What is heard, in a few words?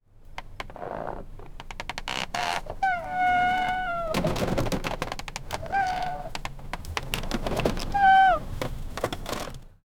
wooden boats creaking; nautical